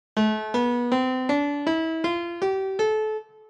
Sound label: hankdrum A minor